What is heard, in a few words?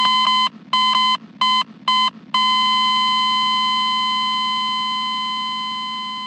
death sound; half-life 2